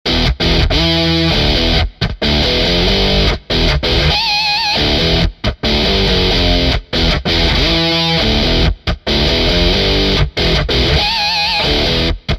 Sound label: high octane stack; logic pro; amp designer